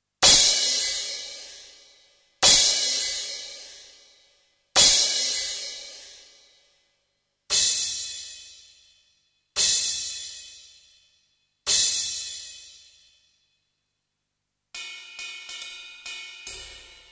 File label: Cymbals; Golden Age Project, COMP-54